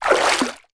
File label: nature water splash swish movement